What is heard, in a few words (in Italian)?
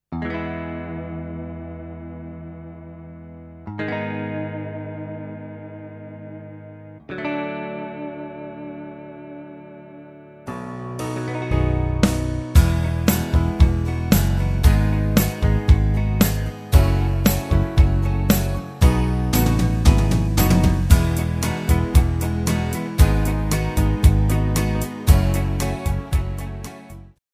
Karaoke base musicale